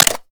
reload quick magout; gmod; viper

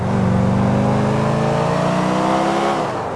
second, vehicles; premier

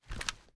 reload empty fast drum end; reloads; gmod; iw8

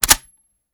ar reload empty bolt release, weapons; gmod; viper

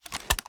sm uzulu reload fast start; gmod; iw8

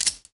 auto semiauto switch, weapons; gmod; csgo

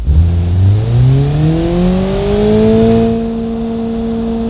first, vehicles

